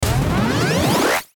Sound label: Power Up Burst Ascend Accelerate; Rise And Dive; Blastwave